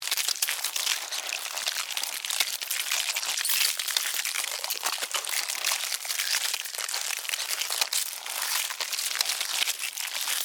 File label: Animal, Ants, Eat, Colony, Devour, Carcass, Chew, Bite, Group, Attack, Bugs, Insects; BlastWave